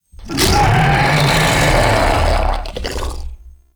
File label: Ancient Tank Player Spotted Scream, Infested; Warframe